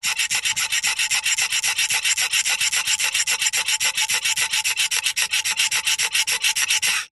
industrial metal lever pump squeaky creaky very fast action; zapsplat